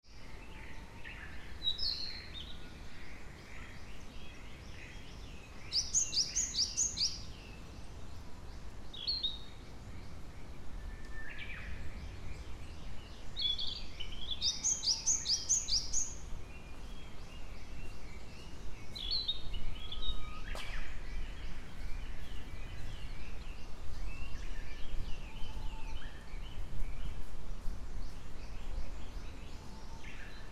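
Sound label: kotori entrance, Fukushima Soundscape; kotorinomori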